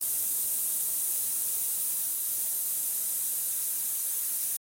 Pepper spray Sound; criminality

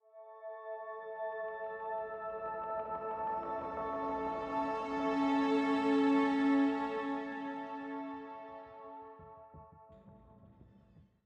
85bpm; Imperium High; Legends of Pegasus; windows gamerip 2012

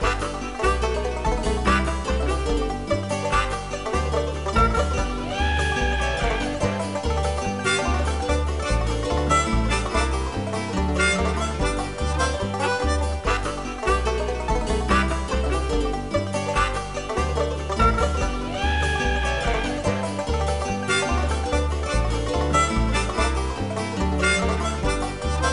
Bonus; Extreme Hunting; Dreamcast

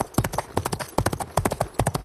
Knight Rider Charge Loop; Linirea

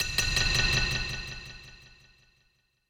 BGM stinger, BGM Player Dying Out; Babylon's Fall

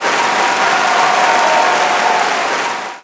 Applause; Totally Angelica Boredom Busters

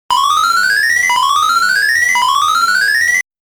Slot Machine- High Score Acquisition Sound

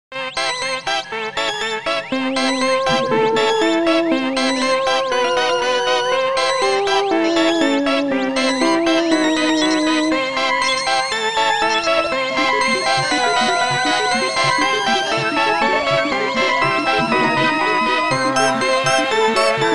Flying Saucer (Happy); Banjo-Tooie

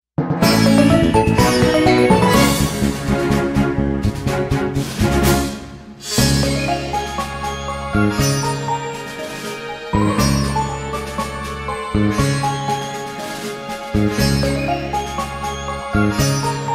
Victory!; Yokai Watch 1

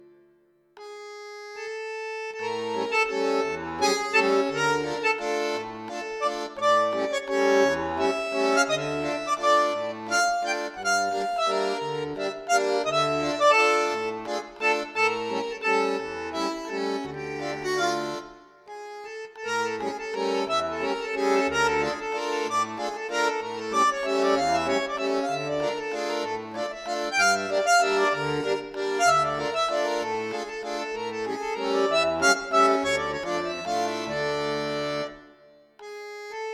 Tavern Loop

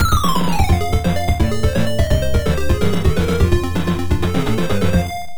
Bonus Stage Success; Shanghai III

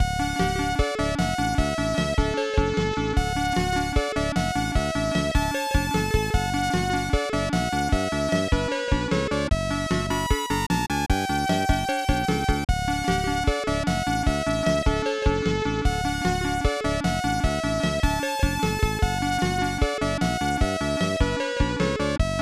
Level BGM; super widget; snes

